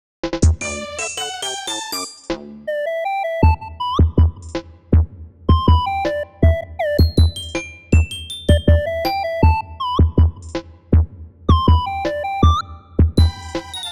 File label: Karaoke Machine